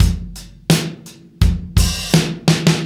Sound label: Testos 084; Zero-G; Monster Beats